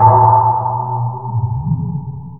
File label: PERC FX, Big Beat; Big Beat Samples & More; AKAI